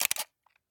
Camera, shutter sound, photo being taken, Point and Shoot FUJI Cam; MKH8040; PMSFX